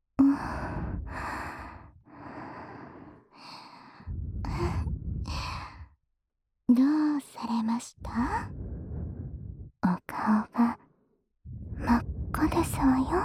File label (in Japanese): 反転 両耳舐め オナホコキ; 親愛の証の両耳キスから始まる性癖歪ませ学園性活; 耳元密着距離